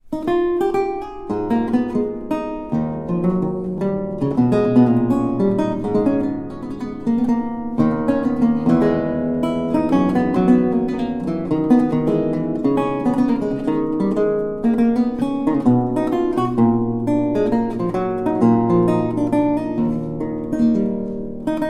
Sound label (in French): Courante, Luth; La Fiere; Charles Mouton